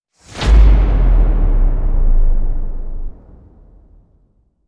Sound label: explosion